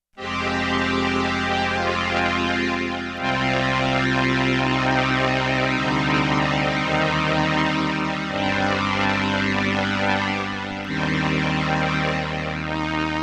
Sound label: BRASS with slow attack, vibrato, sustain, and Ensemble I, Roland RS-202